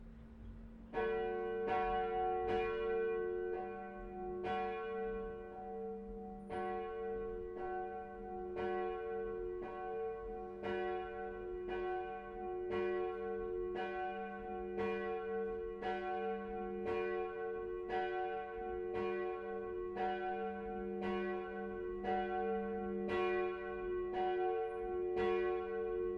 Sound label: bell north heiden fehringerin; bells